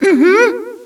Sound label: mhm, Echo inside caves, underground; DS; ML BOWSERSINSIDE; Mario